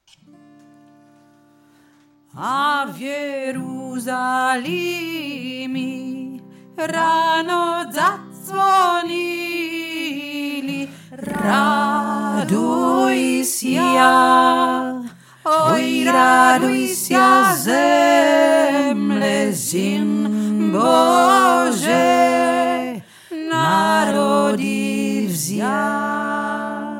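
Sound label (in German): ukrainisches weihnachtslied tiefe stimme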